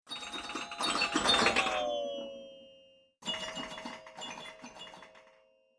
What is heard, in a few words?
doppler shifted ringtones iphone